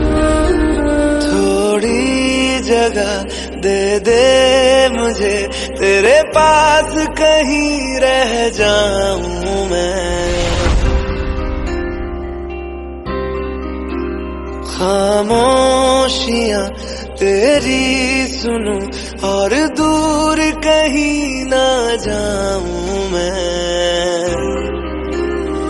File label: bollywood song tone ringtone